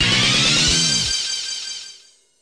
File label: Success; Sega Golf Club Version 2006 Next Tours; arcade